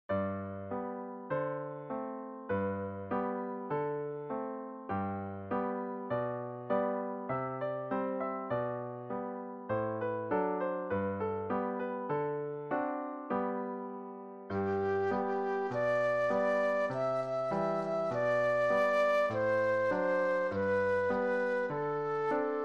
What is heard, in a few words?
Flute Solo